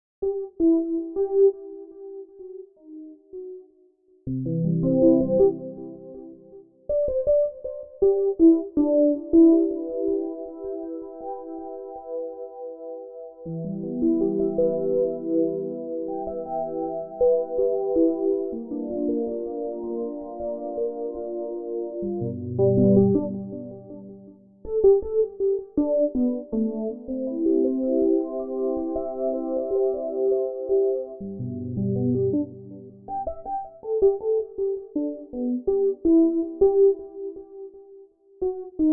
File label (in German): das feine Harfen Mantra